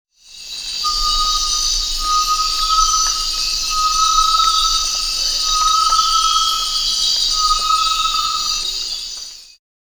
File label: Tinamus major, Ecuador, Birds of the Amazon; S. America